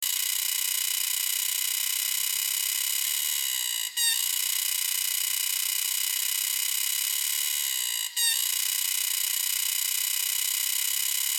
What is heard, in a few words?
Dundubia vaginata